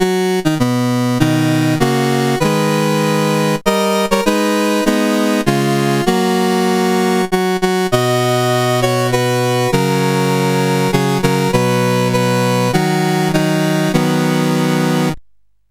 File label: menus, sid CSG8580R5; Games Summer Edition